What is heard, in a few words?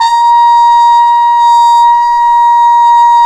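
brass cup mute trumpet, dry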